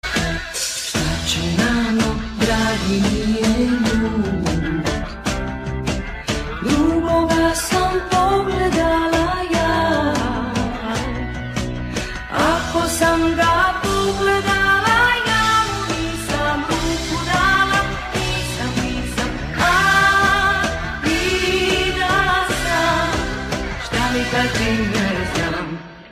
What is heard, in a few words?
Dramatic